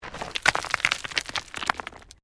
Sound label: STONES MOVE; Material components for constructing more complex sounds